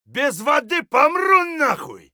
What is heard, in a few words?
dehydrated; Escape from Tarkov